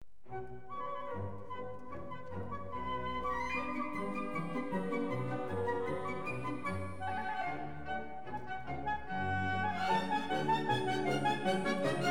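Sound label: orchestra in B minor, allegretto; a dvorsk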